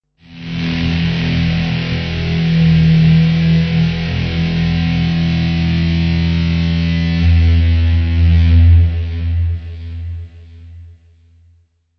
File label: monster; a swell distorted major riff